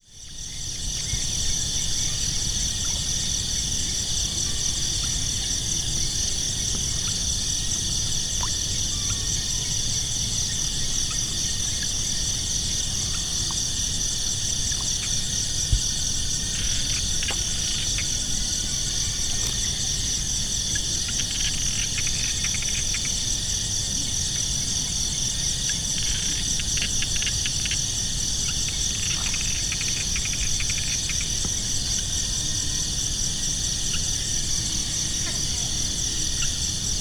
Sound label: angkor wat pond